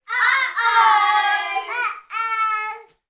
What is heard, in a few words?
human group children x6 uk aged 3 to 8 shout uhh ohh